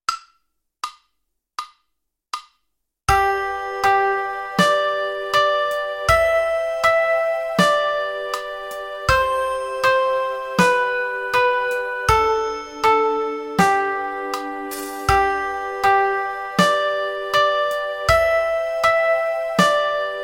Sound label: Ahange Saat bi vocal